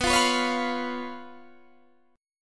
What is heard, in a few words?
B Suspended 2nd Flat 5th, Guitar, Standard; Bsus2b5 strummed; 19,20,21,18,18,19; JGuitar